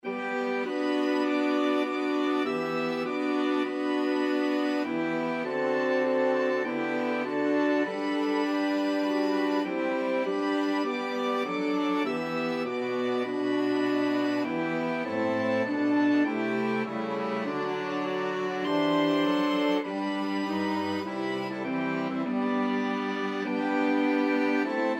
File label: flexible mixed ensemble, 4 players